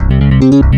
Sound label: Korg, FX